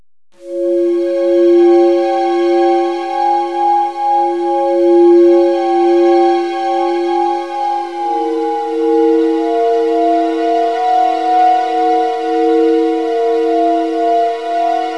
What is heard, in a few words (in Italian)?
musica newage